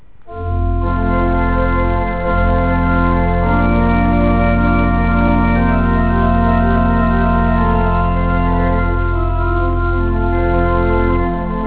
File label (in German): orgel